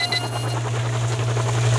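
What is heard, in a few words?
Mixer start, machines mechanical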